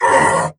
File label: pain; doomguy